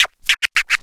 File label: Scratch